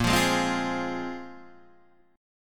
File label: A sharp 7th Suspended 2nd down Guitar Open D